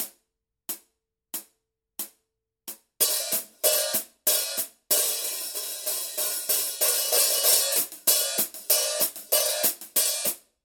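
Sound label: signature 12 combo crisp hi-hat pattern, cymbales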